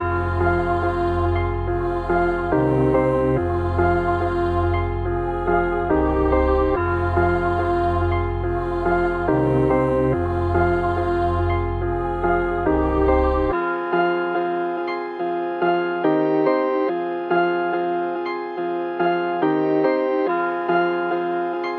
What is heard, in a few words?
142 BPM F Min, Melody; Cymatics; 2022 Melody Collection Platinum Expansion